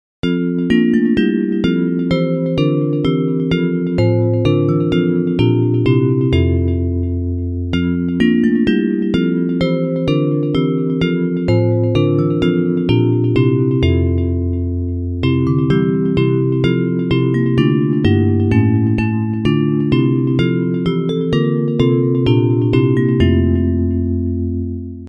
The Day Is Past and Over Bells

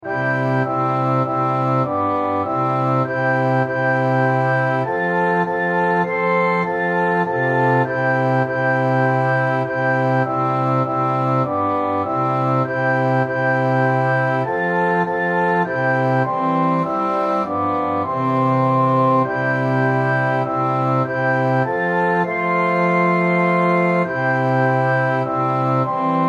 Orchestra, hymn

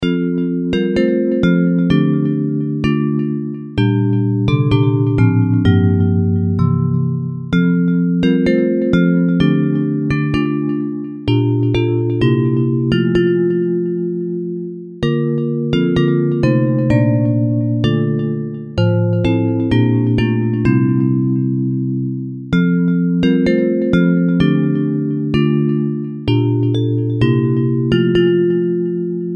In the Bleak Midwinter Bells

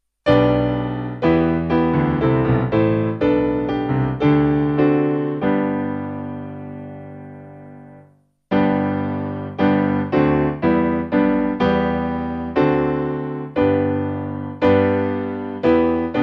Piano, Traditional hymn; All People That on Earth Do Dwell

